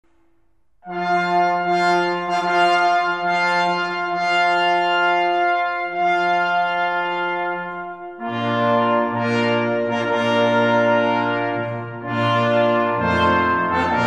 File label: Quintet Bridal Chorus; Wagner